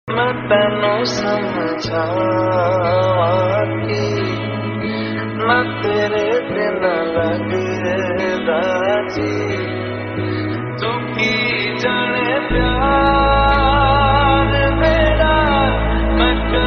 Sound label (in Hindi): X Lofi, Hindi Ringtone